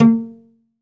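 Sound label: SOLO CELLO, Synclavier Strings; ILIO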